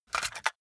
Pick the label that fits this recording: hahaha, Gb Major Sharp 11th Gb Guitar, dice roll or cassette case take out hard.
cassette case take out hard